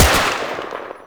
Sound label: Skiff Rifle Fire; Eidolon